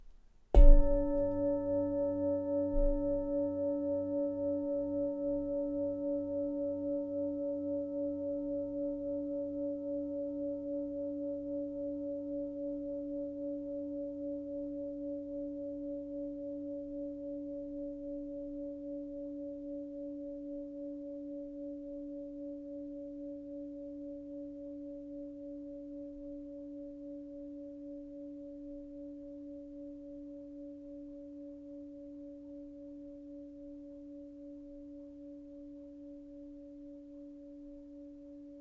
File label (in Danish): Klangskaal, klang og syngeskaal xl